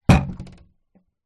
foley plastic water tank small down on ground water inside; zapsplat